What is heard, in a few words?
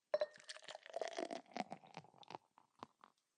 glug